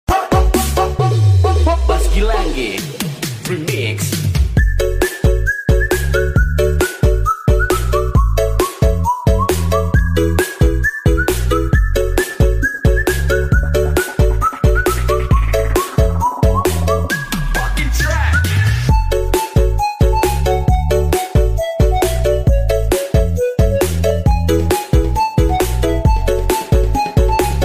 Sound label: DJ Remix Beat; tiktok